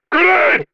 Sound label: throw